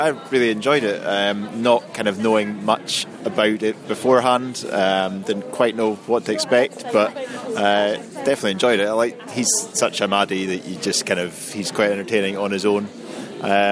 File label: audience reaction; glasgow film festival; jodorowsky's dune